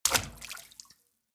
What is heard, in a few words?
step water run in